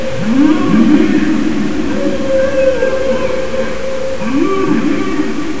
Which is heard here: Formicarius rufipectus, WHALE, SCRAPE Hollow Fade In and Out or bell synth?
WHALE